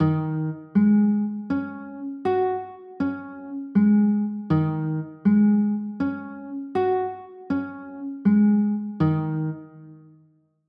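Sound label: G sharp 7sus4 chord, guitar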